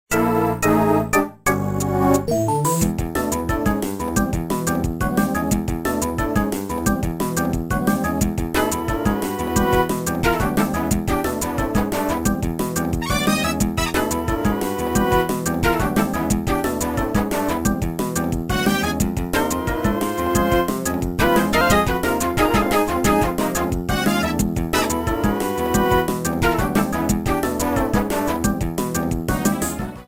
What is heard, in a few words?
Music sample of the Castle boss; NSMB